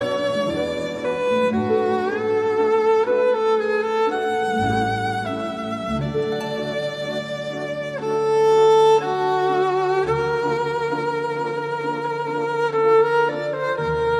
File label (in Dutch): instrumentaal